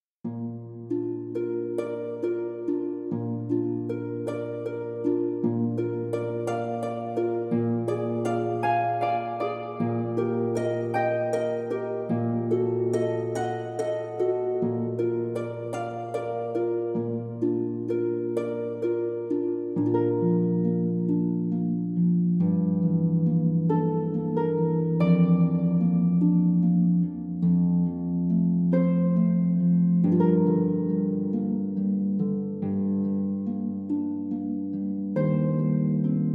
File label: Ave Maria (Harp)